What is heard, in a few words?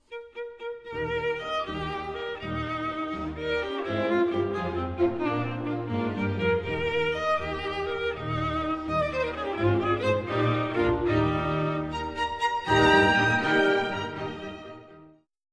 Viola